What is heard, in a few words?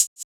swipe hat